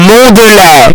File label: bass boosted